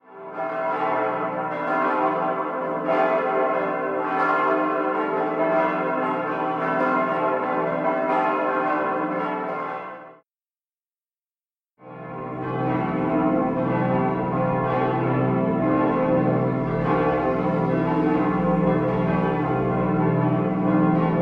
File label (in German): reichenau mittelzell plenum vorher nachher